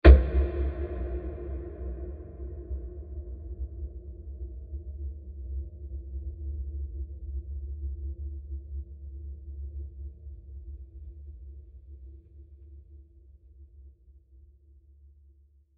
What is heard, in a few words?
Diffuse Long Wood Impact; Impacts; Blasts